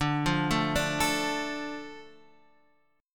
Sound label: D Minor D, chord